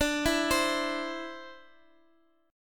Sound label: C-Major Add 9th D, guitar; Cadd9/D arpeggiated